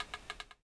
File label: Bow Arrow Pull; Pulling back Bow & Arrow; Young Link